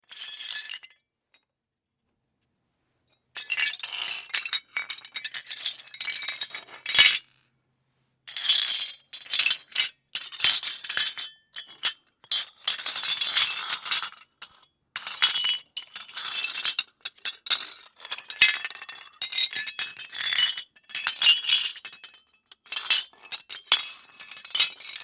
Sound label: rubble breaking